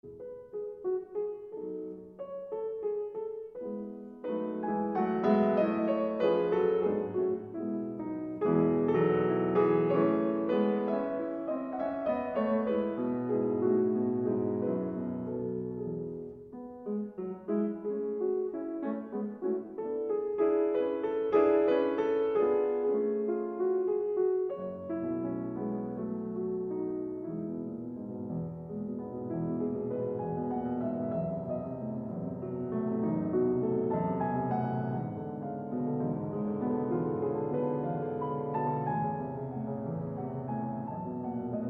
Piano Sonata in E Major Allegretto Con Espressione